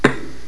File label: hanabi